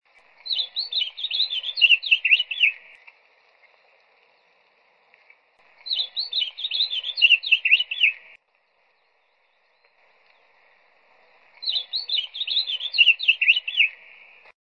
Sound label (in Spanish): voz reina mora chica